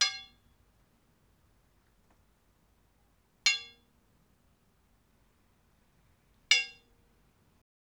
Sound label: 3 hits iron bars cell A rec in ctyard